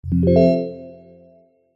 multimedia notification digital bell warm positive